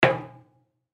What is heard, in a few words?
Ship Footstep Single Metal Stair; Transportation Watercraft; Boat Sound Effects; Blastwave